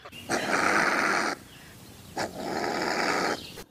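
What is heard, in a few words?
badger; barsuk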